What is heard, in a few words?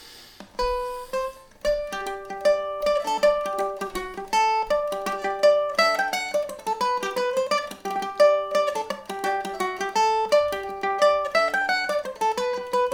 kittys gone a milking, mandolin